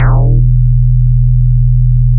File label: out law bass, Analog & Synth techno; USB Soundscan vol.12